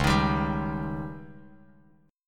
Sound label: Db Suspended 2nd Flat 5th, Bass Standard; strummed